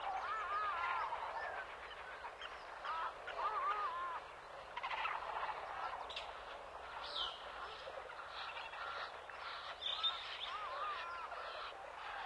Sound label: village, jungle